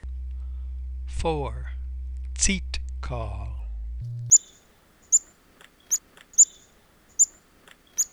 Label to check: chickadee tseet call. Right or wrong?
Right